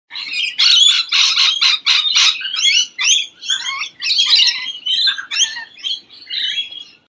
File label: Rainbow lorikeet